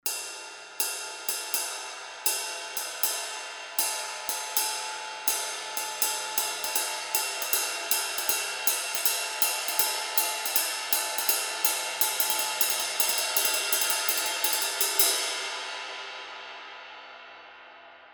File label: 24 zildjian medium ride ride pattern